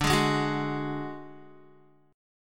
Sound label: D Major Flat 5th D Guitar, chord